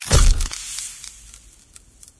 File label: freeze cam snapshot; Source Engine; tf